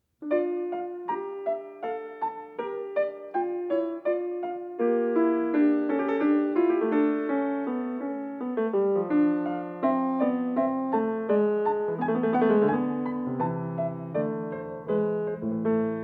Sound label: menuet